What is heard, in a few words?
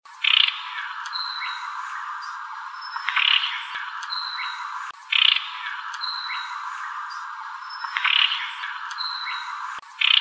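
Sinaloa Wren CALLS; deviche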